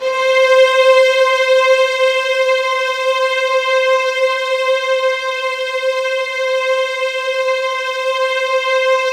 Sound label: C4, Roland JV 2080 TECHNO PAD